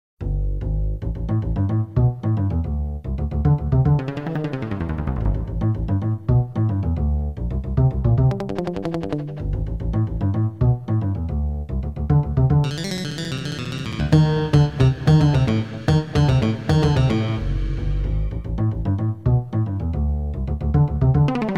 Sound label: Bass Line Combo